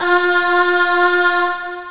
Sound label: voice ah; OctaMED SoundStudio V1; mirage